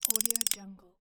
strapping tensioner ratchet single

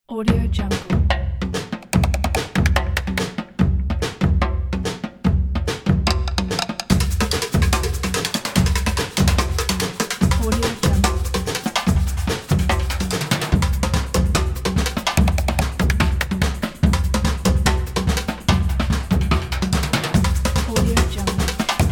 street drum jam

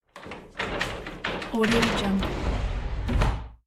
doors sliding glass panel

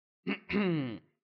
coughing sound

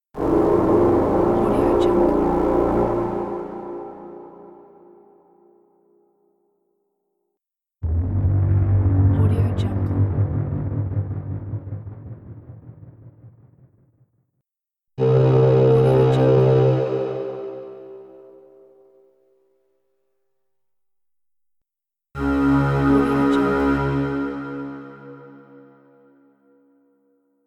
background braams

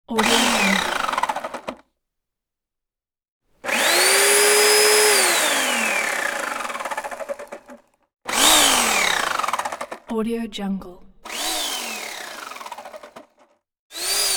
electric chain saw

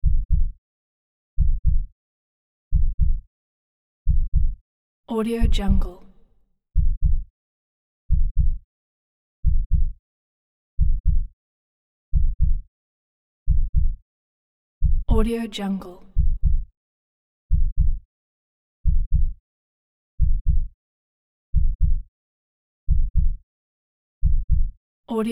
medium heartbeat

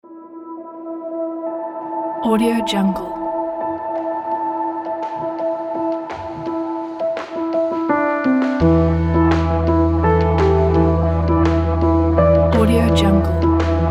action epic industrial teaser trailer

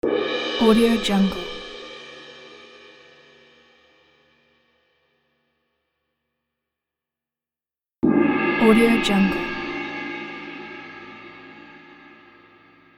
cymbal sound effect pack

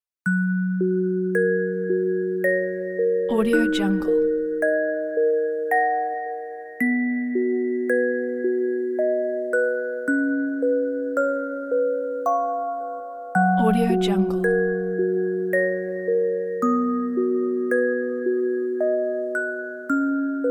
lullaby music box gentle

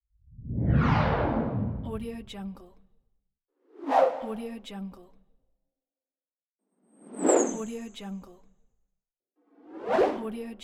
fast whoosh; moonaudioproduction

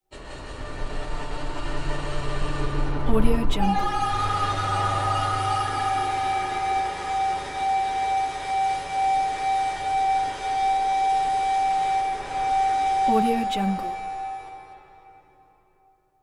gravy lumps

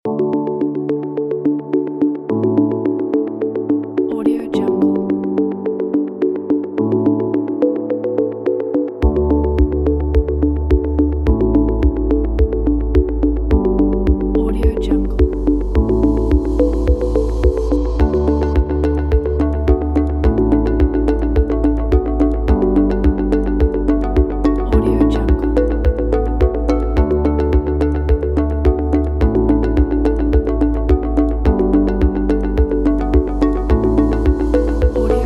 a soft

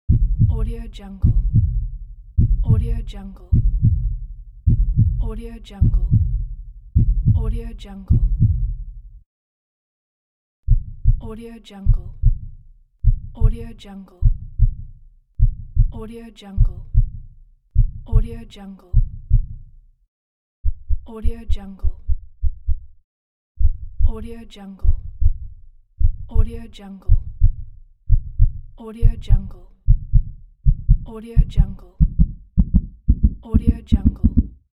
heartbeat

